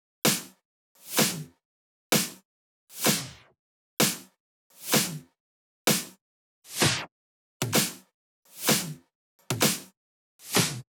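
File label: Drum Loop 128BPM snare REV; VEC5 Drumloops; VEC5 Multi Loops 128BPM; Loop Elements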